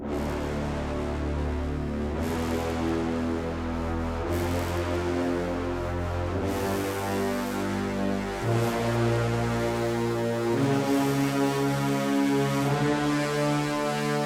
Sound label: Loop; Legend of Keepers (gamerip) (2019)